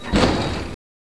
motor, Train; OpenBVE

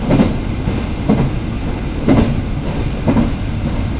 Run 2, train; nankai6000; OpenBVE

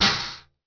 reverser, Train; PKP; OpenBVE; loose stock

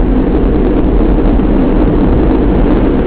Run, Train; OpenBVE

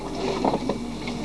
Door Open, Train; KCSV7

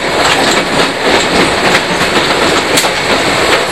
Point, Train; OpenBVE

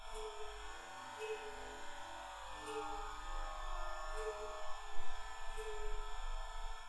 Noise, Train; OpenBVE; Cl313 Silverlink

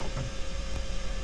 Wet Wipe, Train; Cl323; OpenBVE

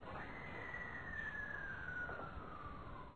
Air, Train; Re460+9EWIV

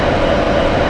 Run, train; OpenBVE

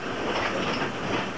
Point, Train; OpenBVE

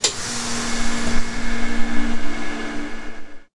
Pan Up, Train; OpenBVE; Cl313 Silverlink

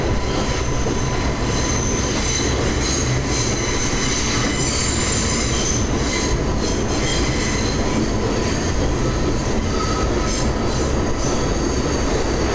Flange, train; OpenBVE

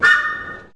klaxon, Train; OpenBVE

